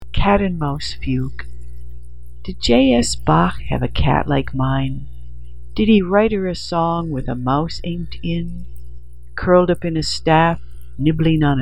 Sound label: Fugue; Cat and Mouse